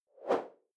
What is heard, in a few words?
whoosh simple short high; swooshes-swishes